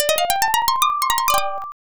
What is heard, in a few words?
game end; wafu